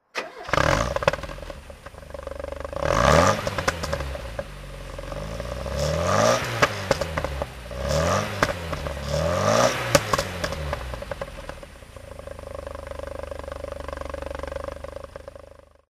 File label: porsche 924 throttle